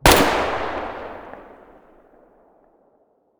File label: muzzle mono distant, weapons